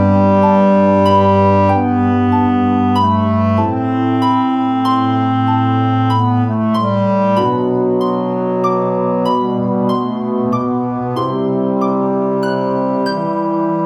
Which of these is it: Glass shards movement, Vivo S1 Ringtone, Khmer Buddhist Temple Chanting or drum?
Vivo S1 Ringtone